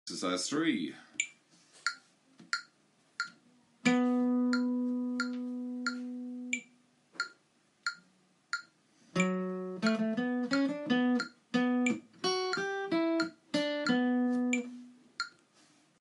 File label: Melody